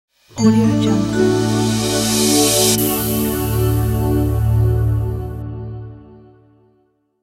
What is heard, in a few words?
tech style ident